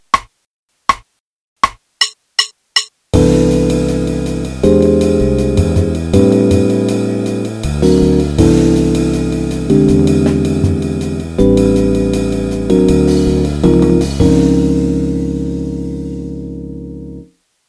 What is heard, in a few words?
C Major Scale, 1 octave qn=160; Play-a-long; Jazz Piano